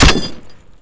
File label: bmp 30mm metal